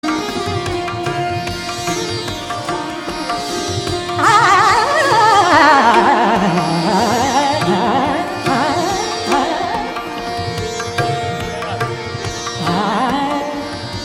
Raga Alhaiya Bilawal Drut Sitarkhani, Indian female vocal; Sampradaya